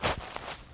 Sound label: foot grass, footsteps; midnight guns